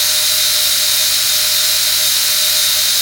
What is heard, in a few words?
Jet Engine; blastmorph pinball; xbox series xs